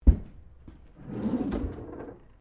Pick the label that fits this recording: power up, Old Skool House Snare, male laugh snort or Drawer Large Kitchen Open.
Drawer Large Kitchen Open